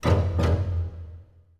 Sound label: Orchestral Loops STRING ACCENT; Best Service ProSamples vol.33; AKAI